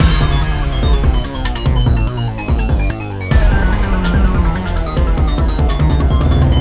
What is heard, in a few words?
Stinger; Melting Chamber